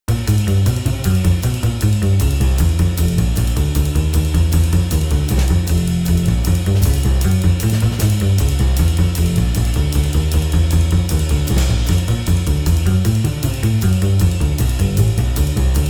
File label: BeBop